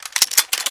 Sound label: Weapon Reload; synthetik legion rising